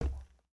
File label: Foot Steel Boot Walk, footsteps